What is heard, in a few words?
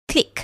click